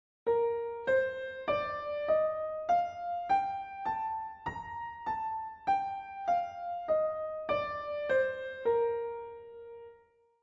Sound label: Bb Scale Piano; California Music Conservatory